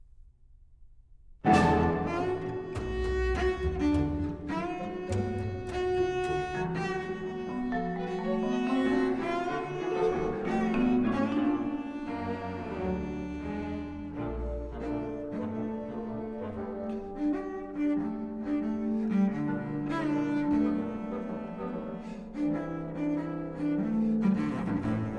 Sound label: concerto for bass viol and orchestra rondo